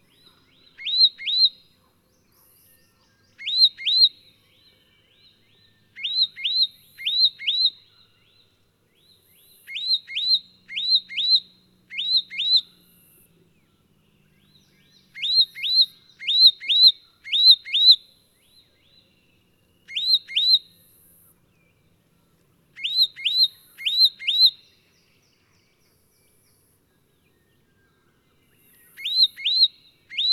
Myiagra Rubecula; Leaden Flycatcher

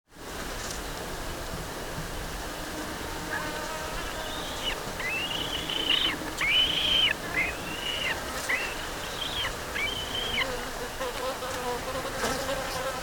Hamirostra Melanosternon; Black-Breasted Buzzard

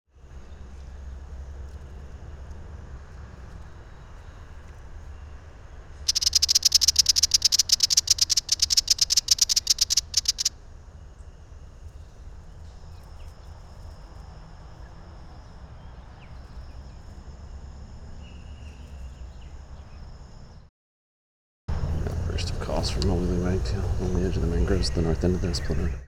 Rhipidura Leucophrys; Willie Wagtail; Call; Call 2

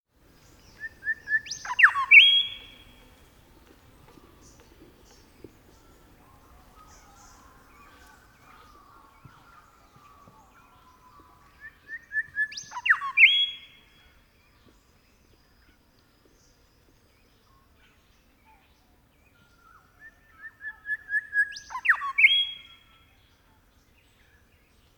Colluricincla Harmonica; Grey Shrikethrush; Call

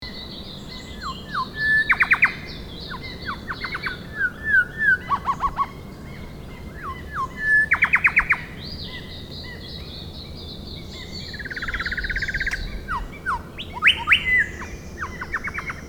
Colluricincla Rufogaster; Rufous Shrikethrush; Call

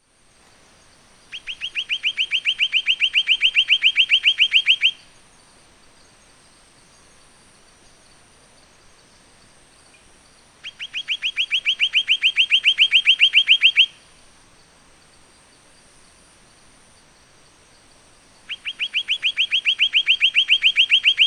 Arses Kaupi; Pied Monarch; Monarchidae (Monarch Flycatchers); Family - Monarchidae (Monarch Flycatchers)

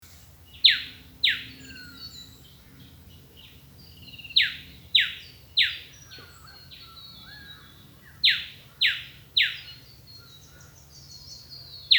Eopsaltria Australis; Eastern Yellow Robin; Call

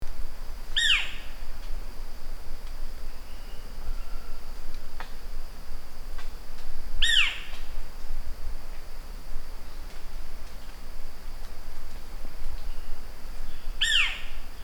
Aegotheles Cristatus; Australian Owlet-Nightjar; Family - Aegothelidae (Owlet-Nightjars); Call

